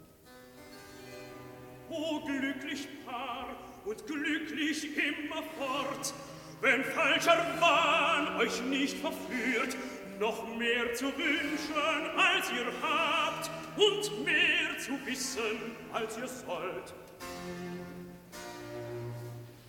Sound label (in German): Rezitativ, O glucklich Paar, und glucklich immerfort; Uriel